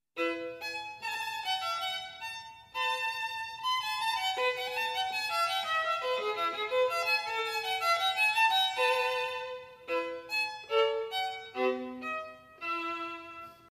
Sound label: violin